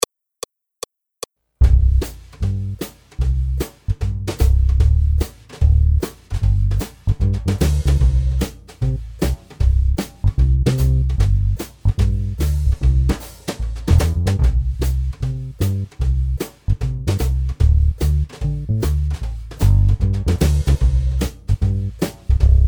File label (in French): Accompagnement seul; Campton races